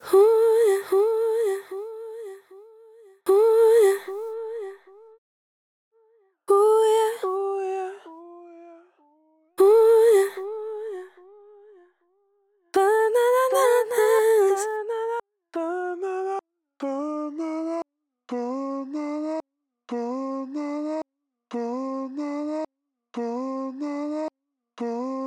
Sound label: korg sdd 3000; Delay-Plugins; uad